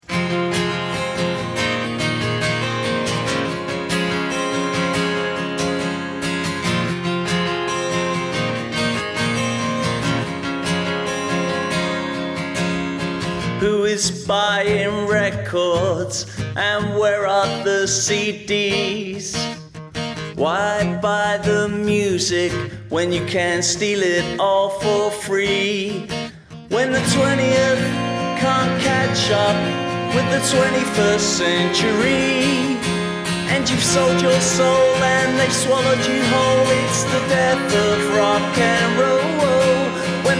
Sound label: Acoustic